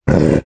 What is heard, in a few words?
centipede die; edgar; enemy